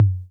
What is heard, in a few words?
Tom Low C 808 Tape, Clean